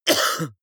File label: cough male single; koerpergeraeusche